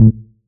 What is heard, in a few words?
Syn Bass; Equipped Music